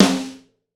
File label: Snare Tama Steel